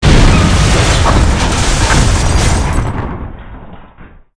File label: CRASH